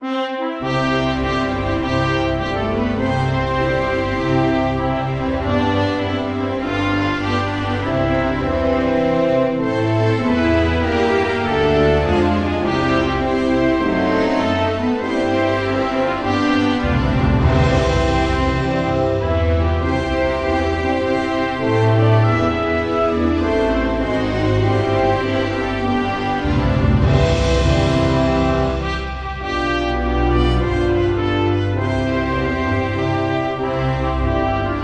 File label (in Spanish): Himno Nacional Mexicano